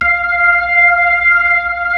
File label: B-3, Club; Roland LCDP10 Keys of the 60s and 70s 2; Club Ch. Xfde